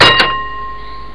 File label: klaxon, Train; OpenBVE; BKV 1948-1949